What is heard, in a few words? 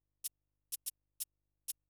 HIHAT HOUSE 125, Drum; eJay